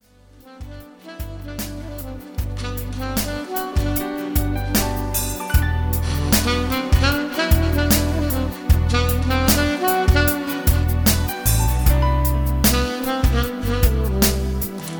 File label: Slow Jam